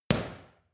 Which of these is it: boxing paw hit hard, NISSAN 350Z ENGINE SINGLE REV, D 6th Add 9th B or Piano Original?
boxing paw hit hard